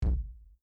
kick; trg

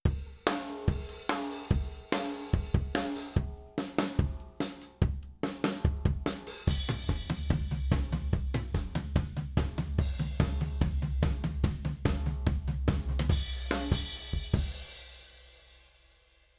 buss

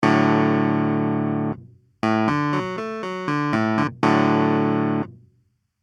clav

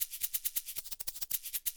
bip

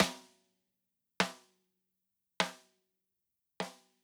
Snare Rim shot

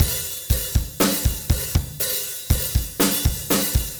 soft

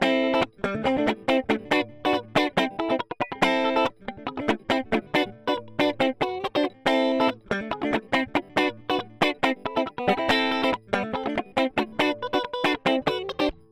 clean non effect

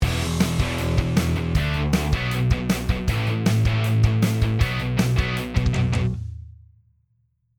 Dst AmpliTube

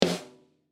Sn top normal

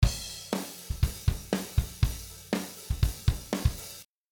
Mini V Efx choirs

